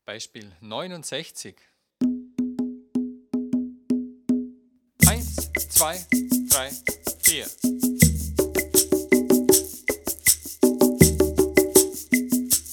viererrhythmus

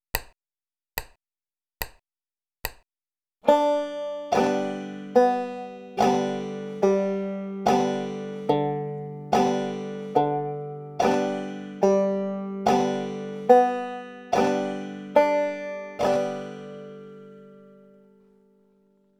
grundanschlag und saitenklettern

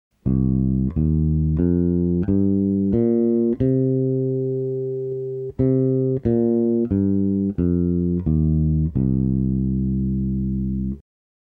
moll pentatonik

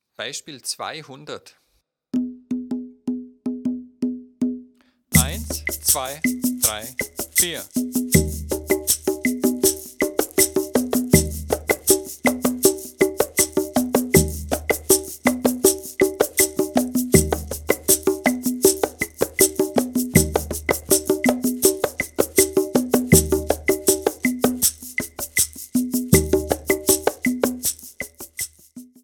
viererrhythmus

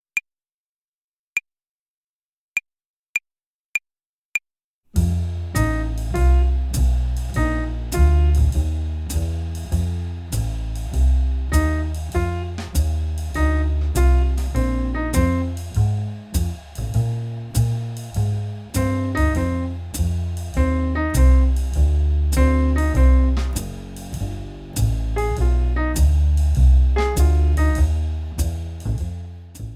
blues tonleiter uebung play along slow

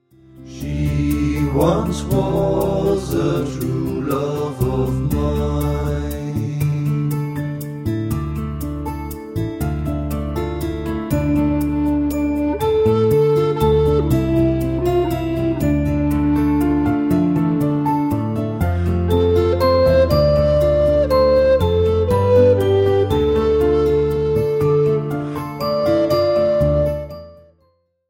ohne ukulele

